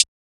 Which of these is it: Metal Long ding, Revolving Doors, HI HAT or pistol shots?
HI HAT